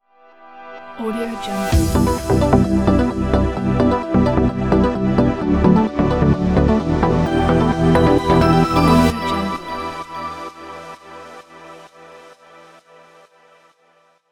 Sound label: trance logo